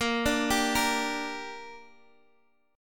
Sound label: Bb 6th, Guitar, Open G; x,x,8,7,8,8